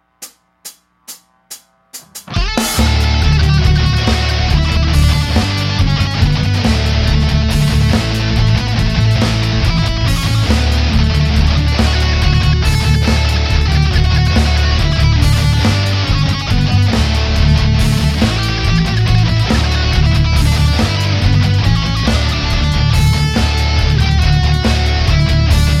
metal; xmas

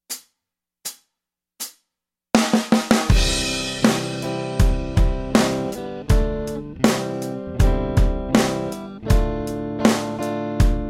no lead; xmas

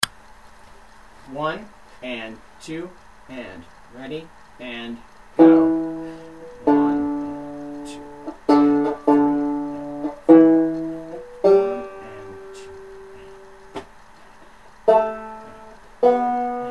worried man blues, banjo

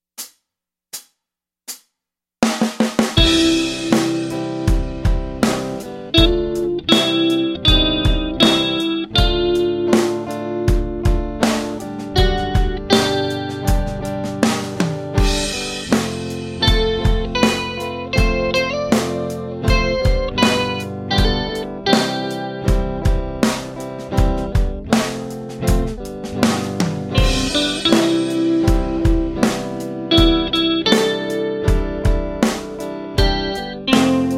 lead; xmas

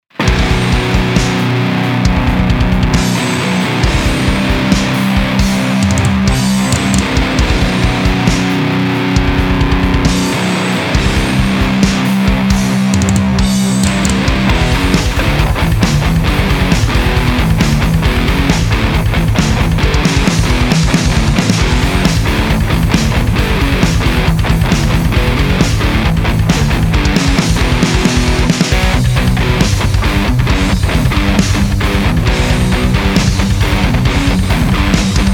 djent hop

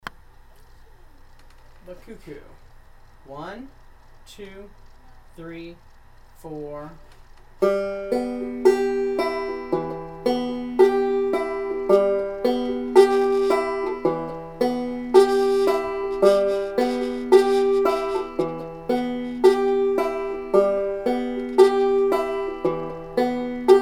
cuckoo roll, banjo

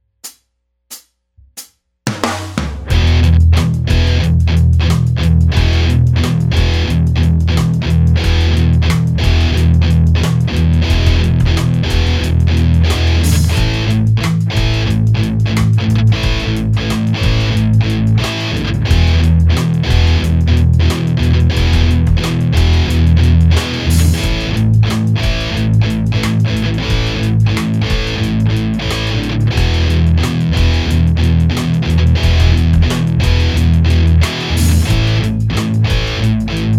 silent no lead; xmas

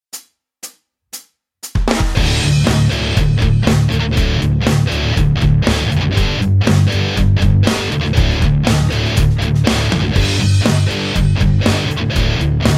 no lead; xmas

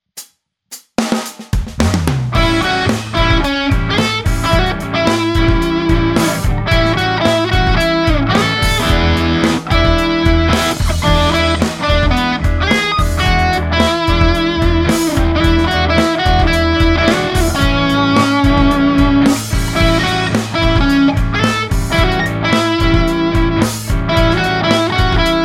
lead; xmas

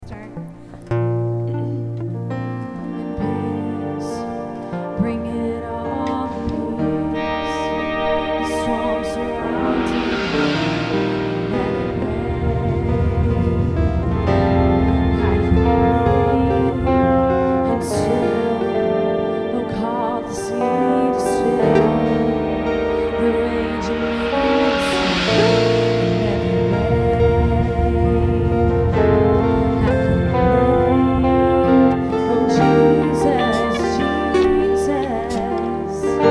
trem guitars

